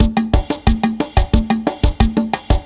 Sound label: salsa 90